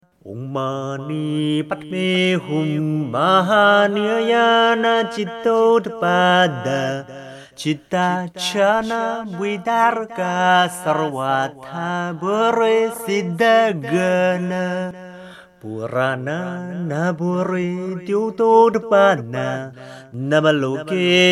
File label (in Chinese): 觀音靈感真言(唱頌); 觀世音菩薩系列 Avalokiteśvara Series